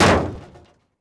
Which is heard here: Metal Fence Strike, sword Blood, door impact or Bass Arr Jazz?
door impact